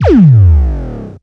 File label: Pulsar Shot, Sci-Fi; Sony Pictures Sound Effects Series Volumes 1-10; Sony Creative Software